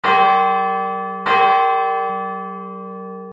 incoming sound; Arc of Templar

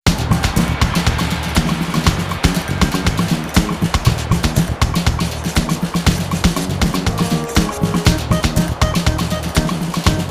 BGM BATTUCADA MIX; asterix obelix xxl 2 mission wifix 2006 playstation portable gamerip

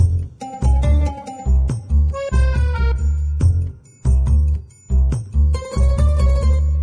Italian Music; Diner Dash 2 Restaurant Rescue

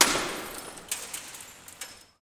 glass break

